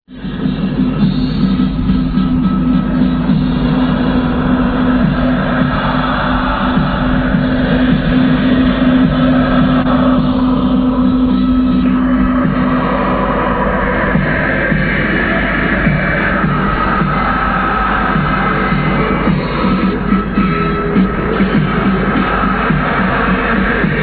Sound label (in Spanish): himno